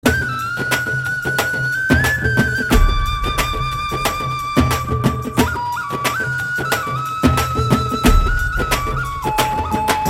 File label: flute tone